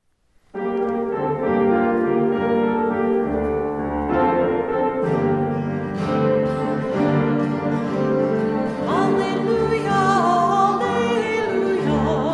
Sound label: Easter Alleluia